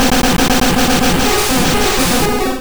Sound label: Bubblebeam; sfx; attack moves; pokemon; gen 2; gsc